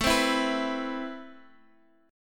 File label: A Minor Add 9th A; Amadd9 strummed; 1,b3,(5),9; x,12,10,9,12,x; JGuitar